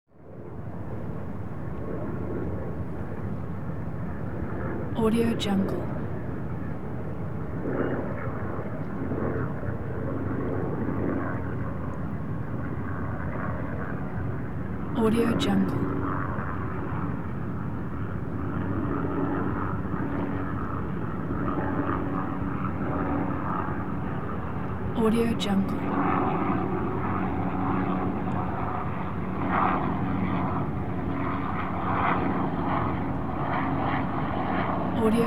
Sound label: helicopter with swirling reflected sound; هلیکوپتر از دور